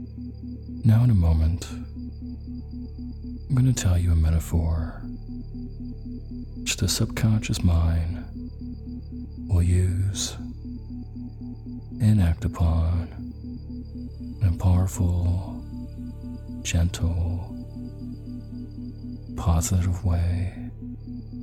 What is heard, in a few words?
Soul Loss With Isochronic Tones